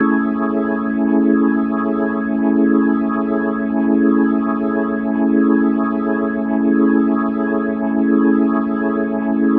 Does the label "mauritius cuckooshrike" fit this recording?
No